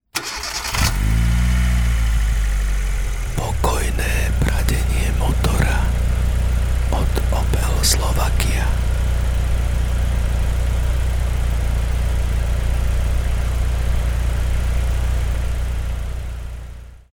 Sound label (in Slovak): pokojne pradenie motora opel